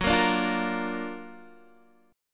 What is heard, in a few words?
F sharp Augmented Major 7th, Guitar Half Step Down